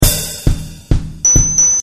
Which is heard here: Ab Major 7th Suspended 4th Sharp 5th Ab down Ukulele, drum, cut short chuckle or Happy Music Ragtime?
drum